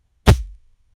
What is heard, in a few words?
fast punch impact dry and; fight